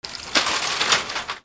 BANGS

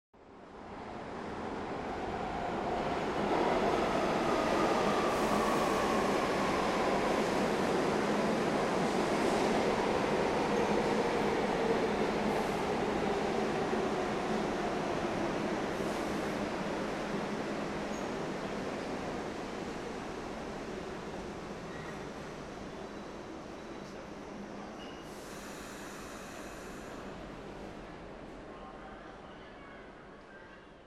TRAINS

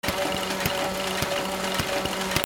INDUSTRY

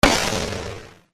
WEAPONS

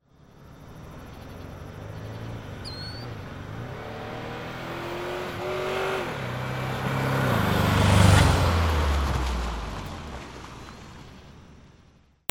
CARS

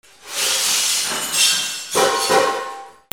BREAKING

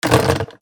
PHONES

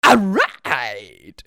CARTOON

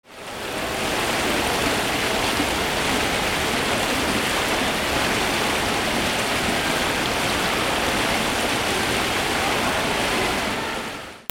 AMBIENCE

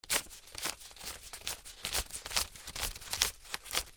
MONEY

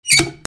BATHROOM

